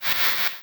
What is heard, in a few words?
Squelch; Inmarsat